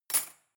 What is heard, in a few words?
cutlery cake fork drawer place hard